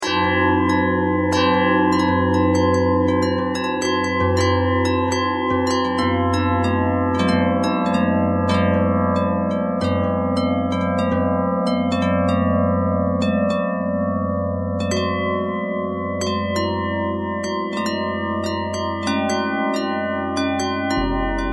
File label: bell harmonic twist